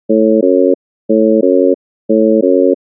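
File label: Missing Fundamental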